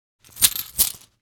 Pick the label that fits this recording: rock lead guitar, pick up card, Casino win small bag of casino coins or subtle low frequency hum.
Casino win small bag of casino coins